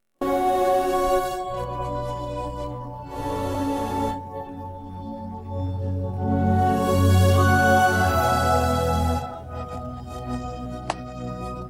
sad no vocals

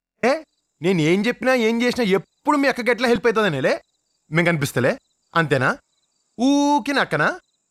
happy vocals

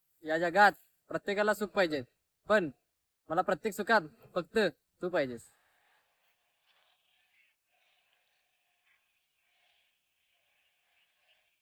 vocals

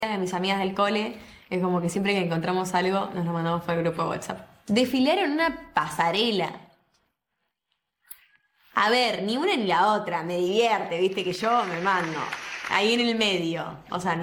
vocals

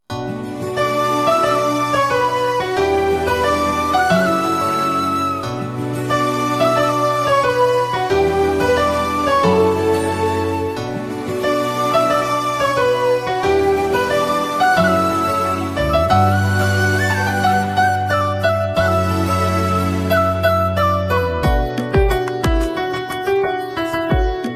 keyboard notes b minor 4 4 tempo 90 no vocals

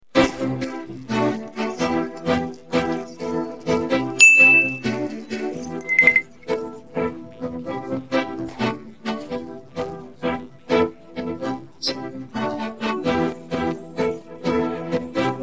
no vocals

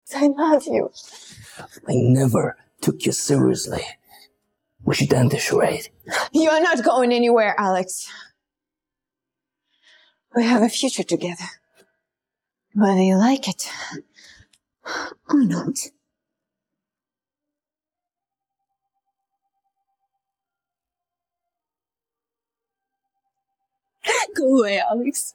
sound vocals

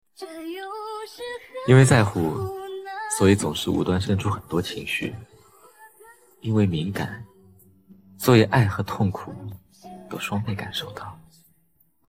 vocals

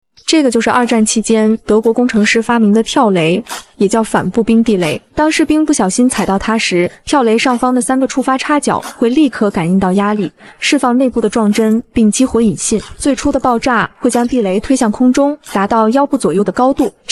vocals

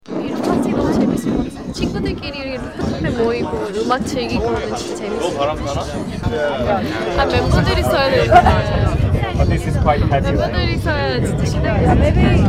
vocals

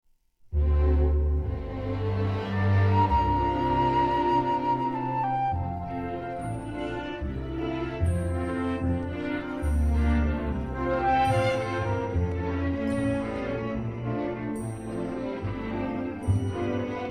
no vocals

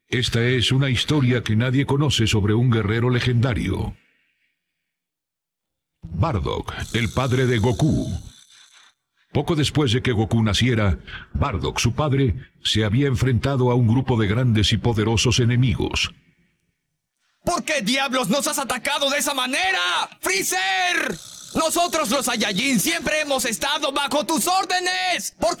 vocals

